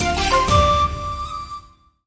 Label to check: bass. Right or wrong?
Wrong